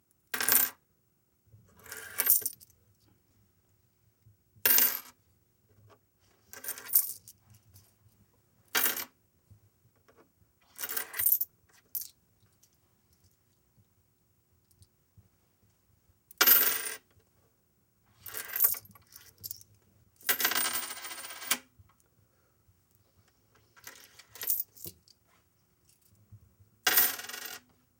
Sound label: Coins On Table